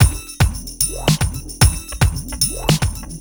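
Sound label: Dance Combo E major, Dance DJ Loops; Pro Tools Essential Loops; Digidesign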